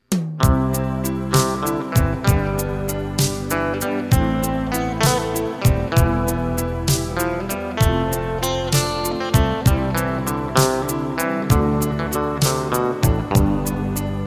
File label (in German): Balade